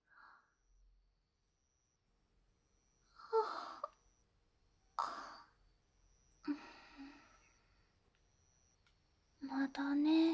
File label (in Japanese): 添い寝 で 耳舐め, ASMR; 添い寝で耳舐めとく